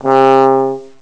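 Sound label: trombone c3, brass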